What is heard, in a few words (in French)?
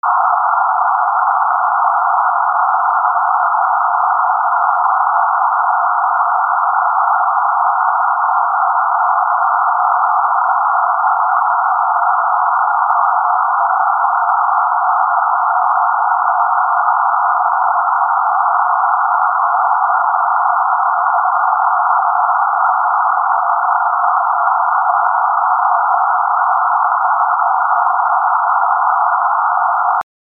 bruit rose